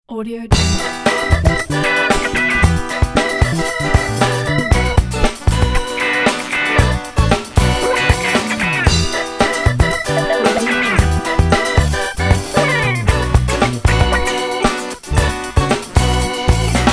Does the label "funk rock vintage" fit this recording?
Yes